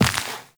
Step Dirt, Footsteps, Walk; Warframe; Characters; Tenno

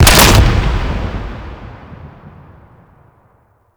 Door Explosion; Archwing Intro; Cinematics

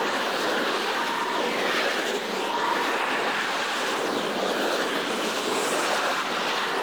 Jump Charge Loop; Tengu; Warframe

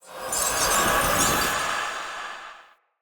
Casting Device Charge Heavy; Weapons; Player; Galene

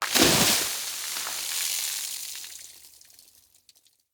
Water Barrel Destroy Splash

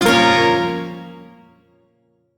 Shawzin Hirajoshi Chord C; Music; Warframe; Duviri; Chords

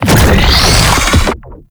Slinky Dead Zone Charge; Warframe; ManInTheWall

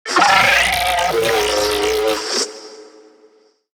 Necramech Ranged Death; Battle Barks

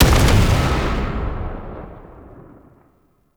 Gatling Gun End Stereo; Warframe; Tau Gatling Gun

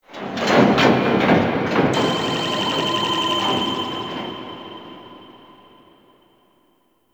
Mid Mech, Ambience; Guild; Warframe; Misc Remaster

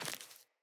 Sandals Run Vegetation, Footsteps

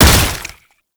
Underground Attack Tentacle Slam; Enemies; Abilities; Warframe; Proto Infested Babau

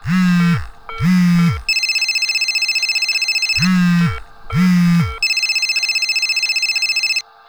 Assassinate Key Loop; Warframe; Nineteeen Ninety Nine

